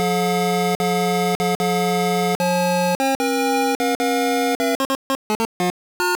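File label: Game Over (Marche Funèbre), IBM PC PCjr Tandy 1000; King's Quest II Romancing the Throne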